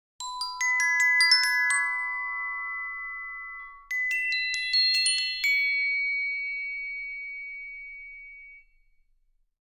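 Glockenspiel, PNS with tonality and time envelope check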